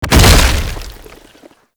tank infested melee impact; Enemies; Abilities; Lasrian Tank; Warframe

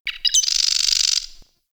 Wren Bird; Warframe; The New War; Zariman; Apartment; Scene Four

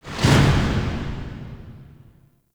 Interior Orbital Station March; Warframe; Tau

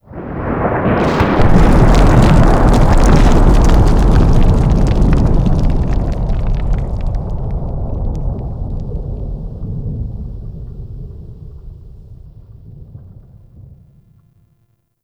Golem Explosion Rumble; Enemies; Events; Warframe

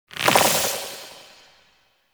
Sentient Threat Bubble; Railjack Hunt

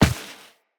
Walk Dirt, Footsteps; Operator

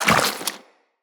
Step Goo, Footsteps, Walk; Characters; Tenno

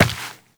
Step Rock, Footsteps, Walk; Warframe; Tenno

